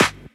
Claps

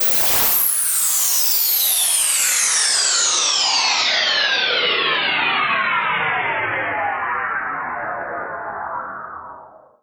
Downlifter

